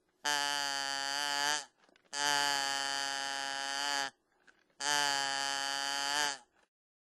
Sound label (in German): kippstimme